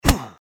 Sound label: hurt; Keep Out! A Dungeon Adventure for the Brave